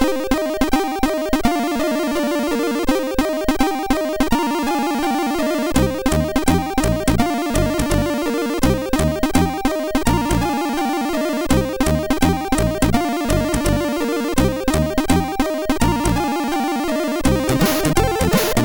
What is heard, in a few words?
Whisteling, MOS6581R4